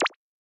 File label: Show Award Bubble; boomerang fu